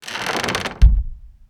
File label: door close